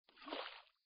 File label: water splash soft